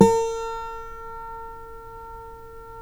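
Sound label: GUILD 12-string, Guitars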